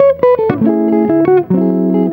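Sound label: electric guitar melody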